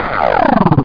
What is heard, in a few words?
dissolve; Game Zone; CHECKERS INTERNATIONAL